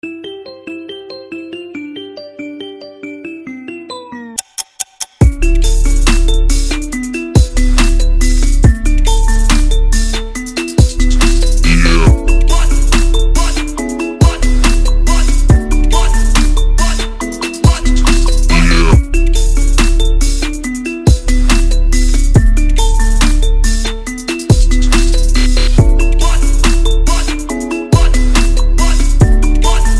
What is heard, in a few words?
Ringtone Remix